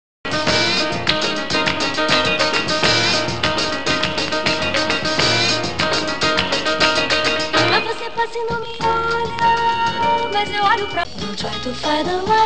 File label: lp vinil